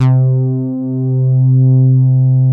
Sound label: Moog modular; Greytsounds VOL-1